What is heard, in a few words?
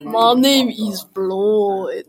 brtuhhhhh